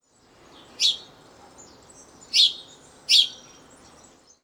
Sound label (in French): moineau domestique